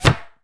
Bullet Impact Wood Light; JASEM Just Another Shooter with Electronic Music

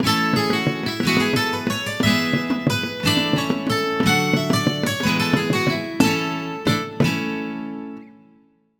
Horse Racing - First Meeting with Horse; mario sports superstars 2017 3ds gamerip

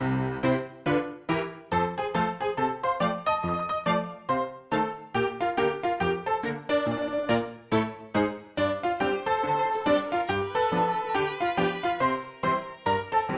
BGM Area Bar; aster tatariqus android ios mobile gamerip 2023

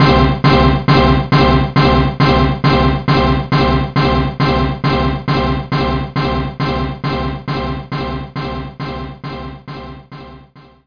orch hit; Knights Try

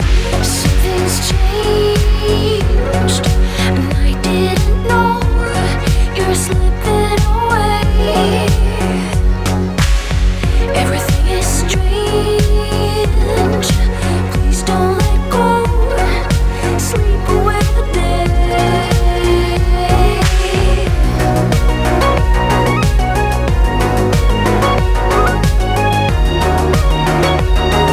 Music Menu Loop; sayonara wild hearts 2019 gamerip

